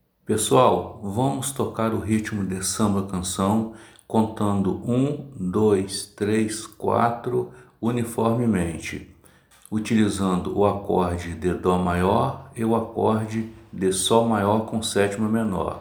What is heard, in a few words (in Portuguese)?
Ritmo de samba canção